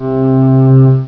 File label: Smooth; Prince of Persia